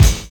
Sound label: OU VOX; Univers Sons